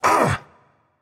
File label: ouch; smite; hachiman